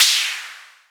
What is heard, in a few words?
Noise Hat, FX Hit; Drums; Ableton Live 12 Lite; Core Library